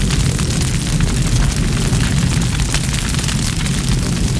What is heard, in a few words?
fire; jinx base